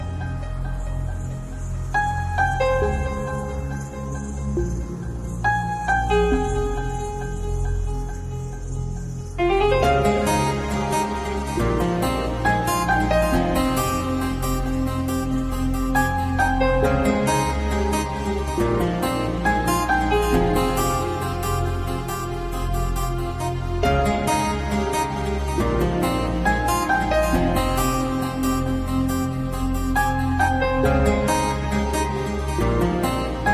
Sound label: Ringtone